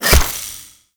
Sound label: Rain Of Arrows Impact; Warframe; Duviri; Dax Archer; Abilities